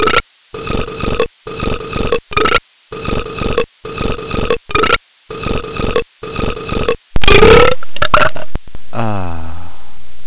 Ritmo, Rutti

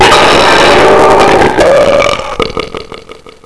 Eco Rutto

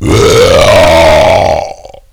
Bestiale, Rutti

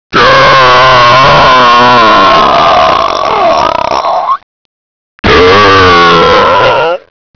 Bestiale, Rutti

